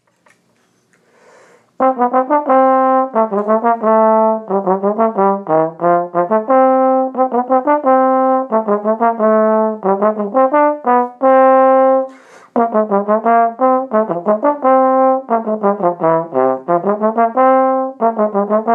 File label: Polka; 8th grade all state